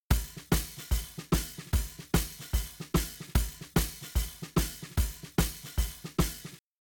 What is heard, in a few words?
Drums, shuffle drums; WDR2